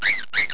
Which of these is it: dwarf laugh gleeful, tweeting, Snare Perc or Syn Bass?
tweeting